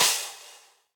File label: Crash FX 18 Steely Tight, Cymbal; Drum Hits; Standard Hits; Session Drums Studio